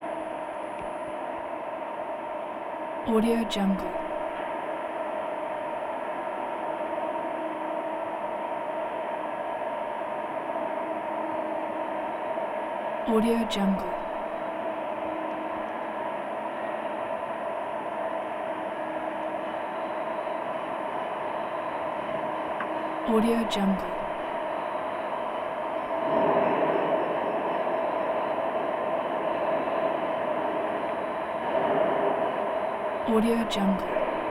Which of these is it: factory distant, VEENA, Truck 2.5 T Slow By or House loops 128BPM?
factory distant